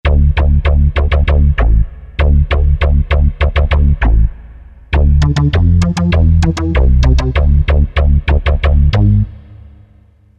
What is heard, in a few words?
bas, E-mu Proteus FX; LosAngel